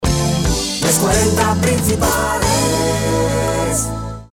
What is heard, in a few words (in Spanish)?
jingle; 40 principales